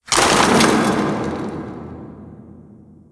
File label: debris random, ambient